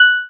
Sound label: ui notification